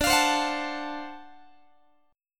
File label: D 7th Sharp 9th, Guitar; D7#9 strummed; x,22,20,22,22,20; tuning 4A,4E,5A,5D,5G#,6C#